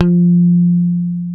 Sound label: PICK F 4, Bass, ROCK PICK; Spectrasonics; Bass Legends